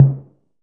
Tom Mid, Drum Machine; Playdate SDK

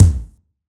Kick Tom

